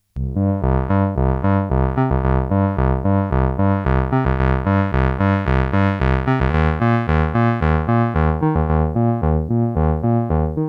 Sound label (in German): Casio MT 400V Bass Solo und mit waw filtered